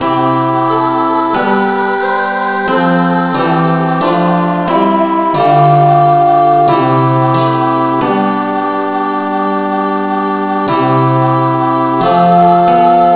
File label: no vocal key c